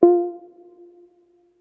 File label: Menu Select; Martian Chess